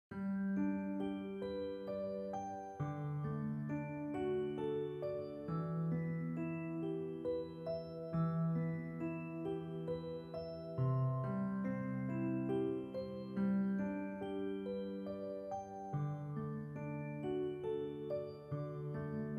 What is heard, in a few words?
Peaceful Healing Energy